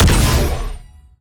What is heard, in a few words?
Attack Dash; bloom minigame